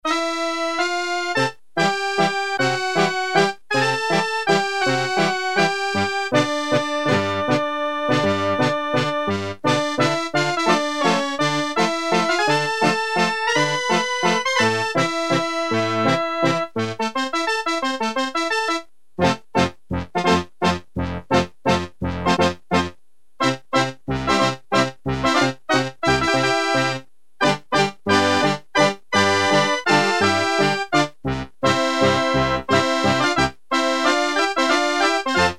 ACCORDION, Casio CZ-1000; Preset

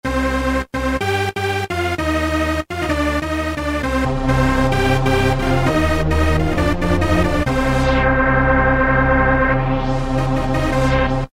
Dance Strings; Roland M-DC1

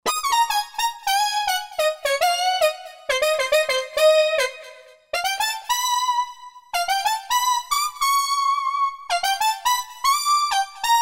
Roland JD-990; Factory Patches; Fusionic

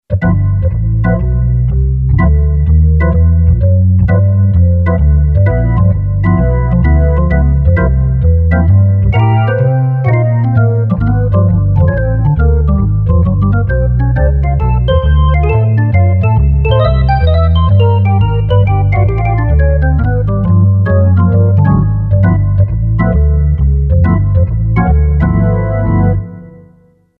Jazz Organ; Yamaha PSR-550; Voice

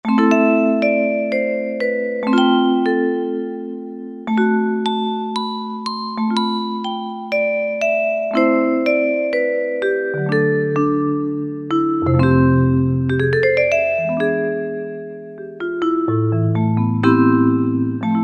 Vibes, Korg M1; Factory preset examples

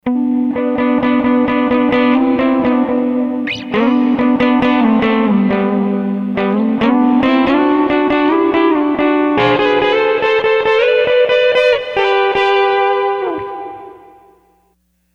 E Minor 3rd; Sony HR-GP5

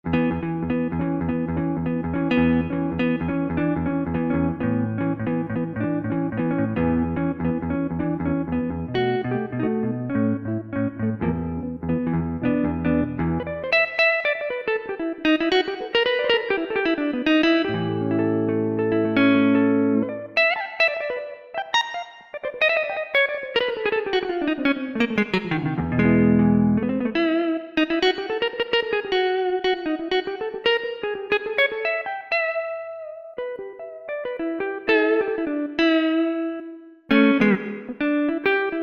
Guitar

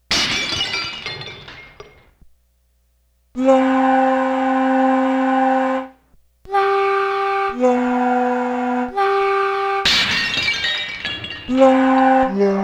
BREAKING GLASS LAGONG

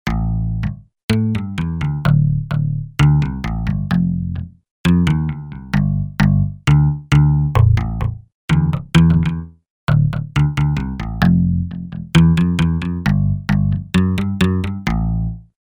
Hip Bass; Factory Patches

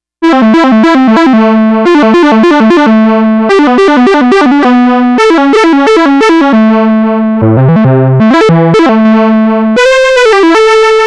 ELECTRIC GUITAR Minimoog Sound

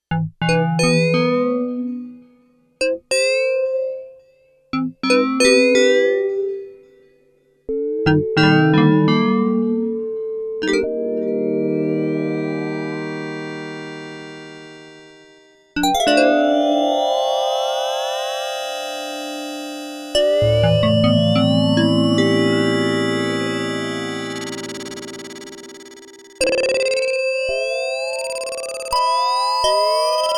BELL SE, Korg DW-8000; Factory Patches

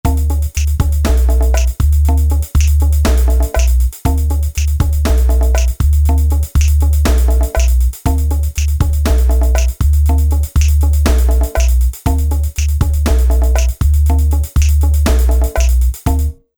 Jungle

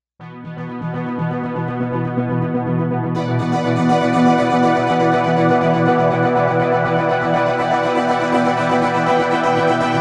LFO switch

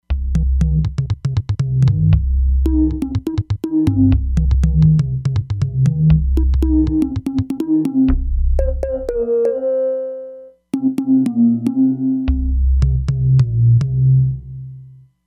Baya Suwuk, E-mu Proteus-3; Factory patches

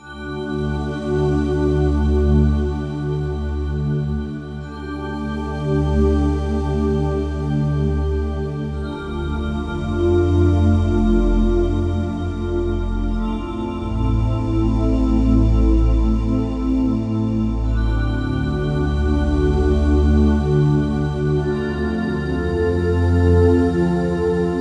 Ghosties, Roland D-70; Factory Performances

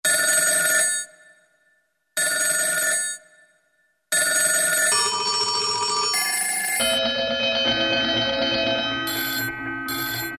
TELEPHONE; Latin And F.X. Percussions; Roland SN-U110-02

